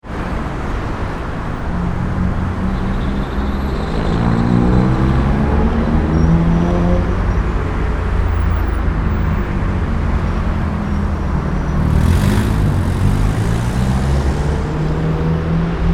ambience urban traffic med busy city, City Traffic Ambience; City Traffic Ambience - Medium Busy Near Junction; Backgrounds; Traffic and Cars; McKinneySound